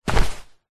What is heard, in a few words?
sport wetsuit drop ground; zapsplat